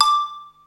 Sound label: BELL, DRUMS; SoundFactory; SMPMANIA